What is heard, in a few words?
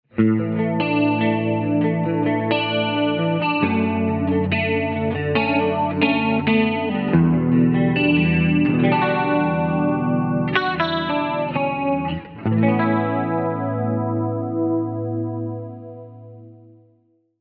X3L Clean Chorus